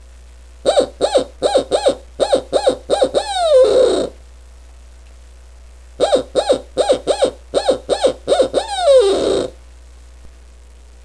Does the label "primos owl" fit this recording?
Yes